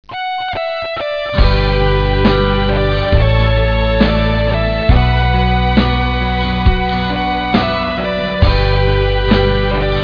beats

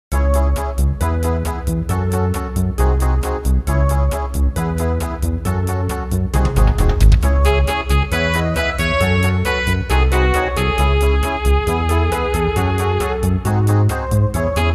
rain band

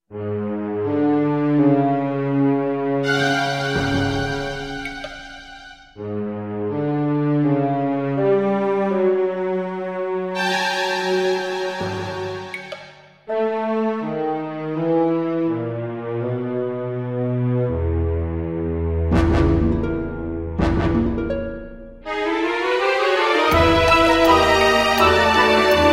dungeon

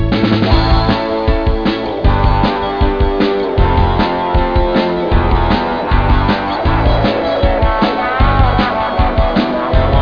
beats

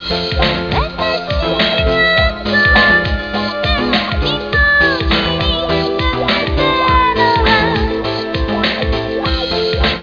melody

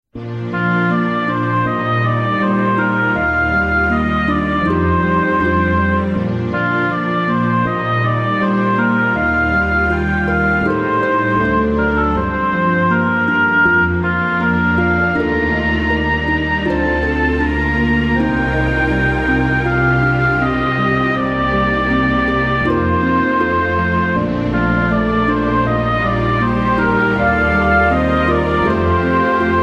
town; 町の音楽D; 穏やか・暖かい・平和

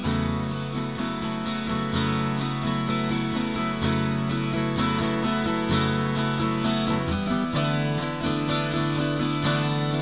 beats

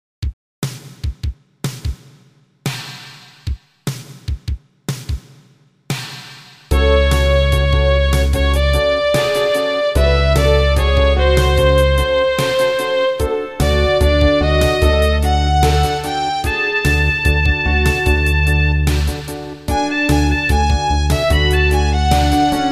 swamptronica

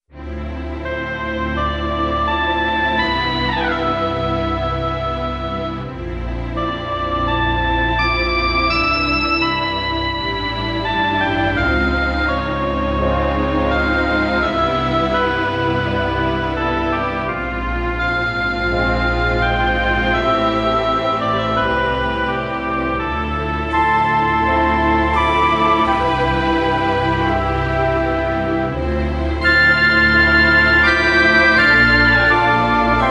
dungeon

